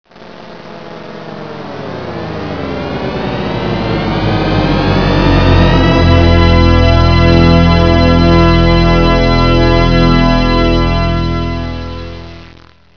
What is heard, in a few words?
synth